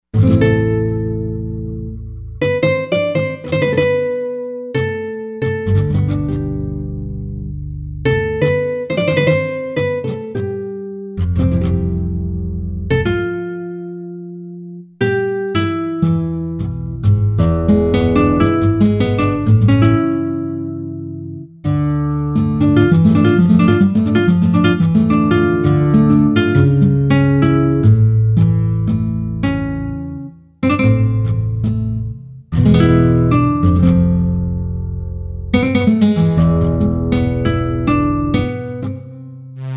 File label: Flamenco Guitar